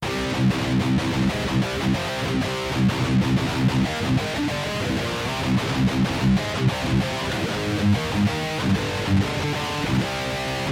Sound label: metal machine bridge dirty